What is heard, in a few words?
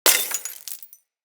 Bullet Impact Debris Glass; Weapons